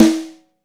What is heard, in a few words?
YAM, YAMAHA SNARE